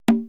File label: drum, 80s Drum Set, DRUMCOMPUTER; Best Service ProSamples vol.55 - Retro Sampler